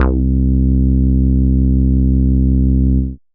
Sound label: Moog Bass, Dance Synths; Best Service ProSamples vol.37